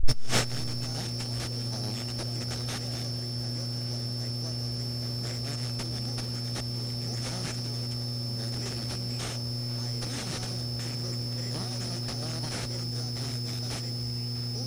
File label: AM Radio Static Broadcast